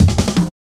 Hard Edged Cut, Drum Loops; Zero-G Jungle Warfare 1, 2 & 3

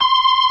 B3 C 3-3, ORGAN

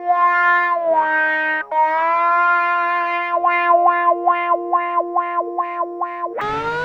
Lap Steel; Trip-Hop

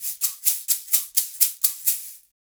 SHAK, Percussion Loops

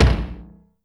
BD Industrial, YAMAHA RM1X

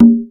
Dance Drums

